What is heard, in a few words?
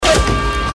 short industrial event